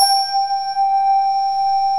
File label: SYNTH CARILN